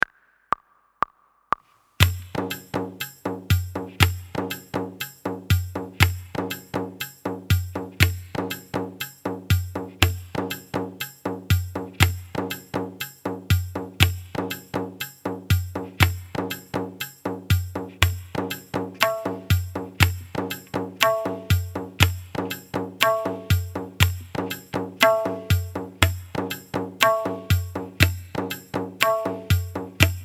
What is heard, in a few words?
Ti Practice with Na With Tabla